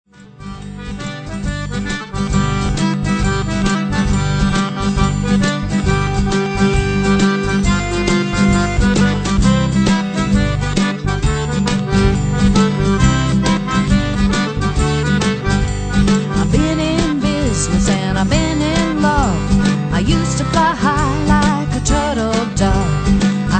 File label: morning blues